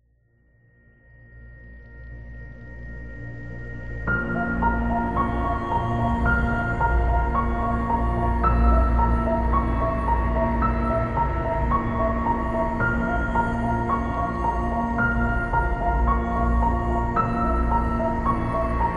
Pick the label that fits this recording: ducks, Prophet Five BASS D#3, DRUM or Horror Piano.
Horror Piano